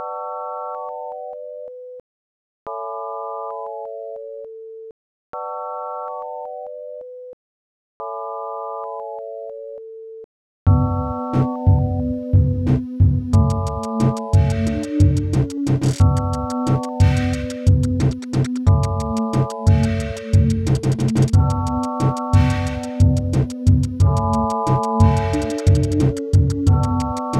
Sine Wave

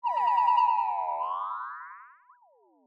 Single Sine

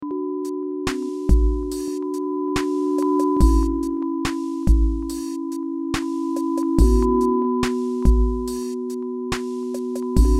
Sinewave

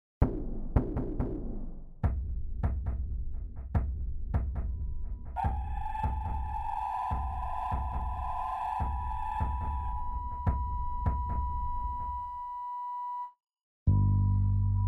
Signwave Project

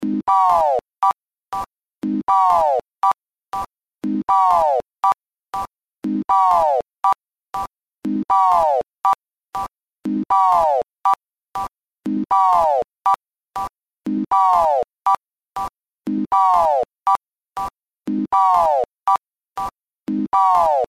Sinewave

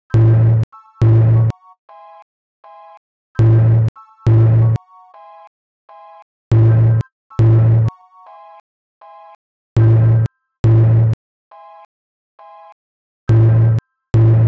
Sine wave

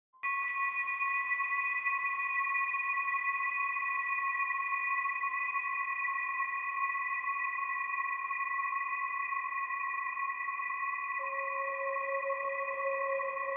horror

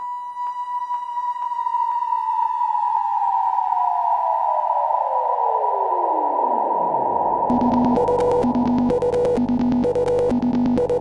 Sinewave

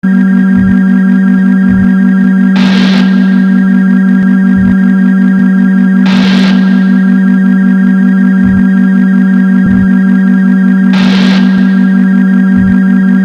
sinewave

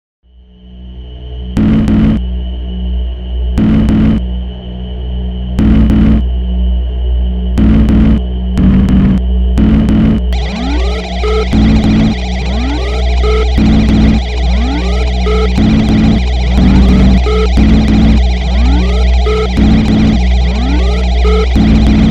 Sinewave Martians Attack

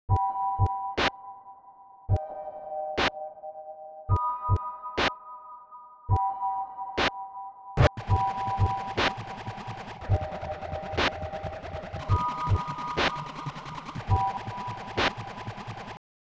Sine Wave

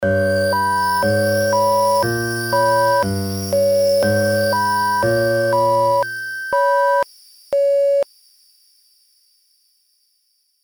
sinewave

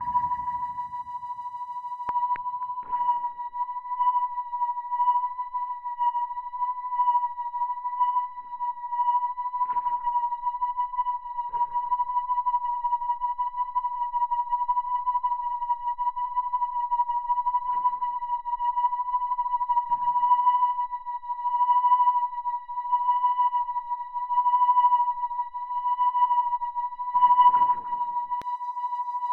Sinewave

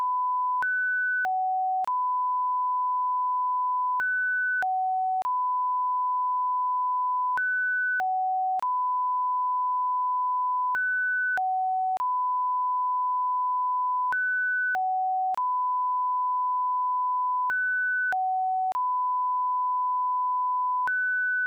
sinewave

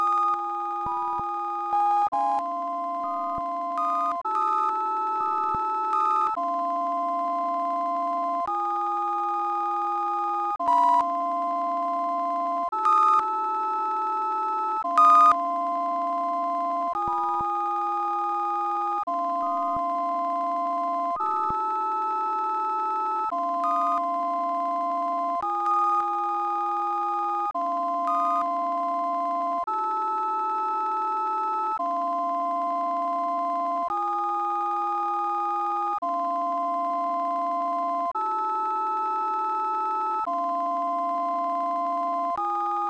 SINEWAVE SONG

